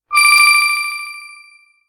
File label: Magic Bell